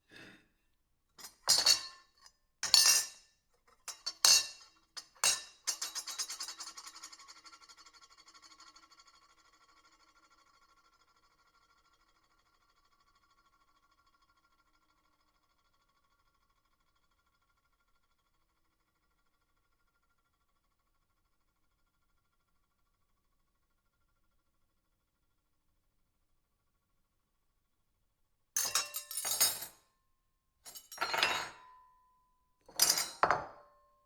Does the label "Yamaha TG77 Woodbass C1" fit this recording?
No